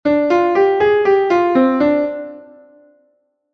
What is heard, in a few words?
blues phrase